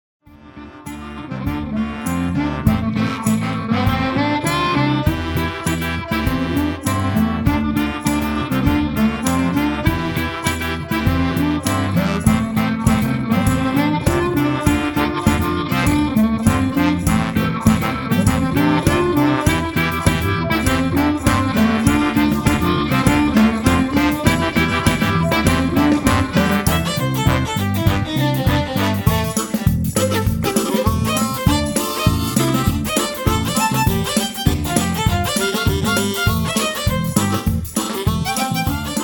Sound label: big blues, bluesharmonica